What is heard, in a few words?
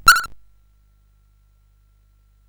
sid CSG8580R5; hvsc